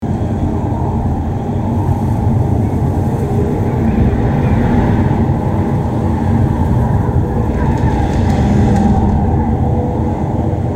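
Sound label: Ambience Blizzard Pipe Interior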